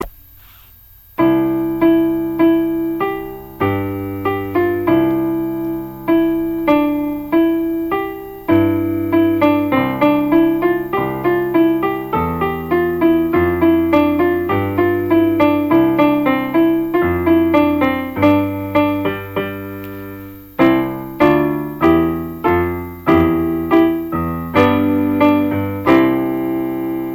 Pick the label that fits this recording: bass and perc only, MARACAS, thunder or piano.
piano